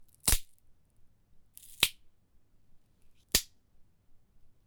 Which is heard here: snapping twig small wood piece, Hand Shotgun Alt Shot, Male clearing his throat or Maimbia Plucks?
snapping twig small wood piece